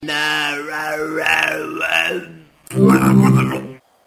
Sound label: arghwa